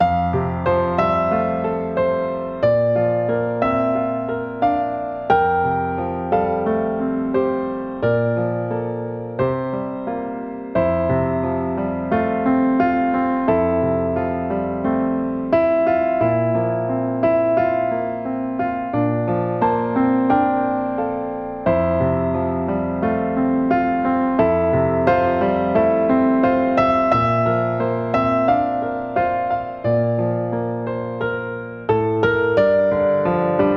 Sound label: accomp